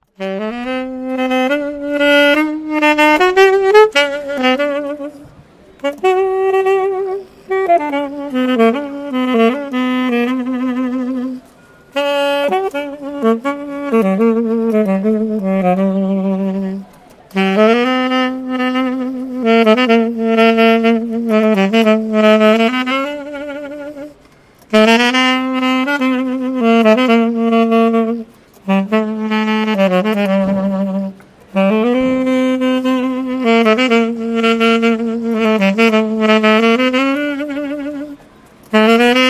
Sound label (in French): saxo